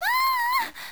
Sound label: scream; GBA; MLSS; peach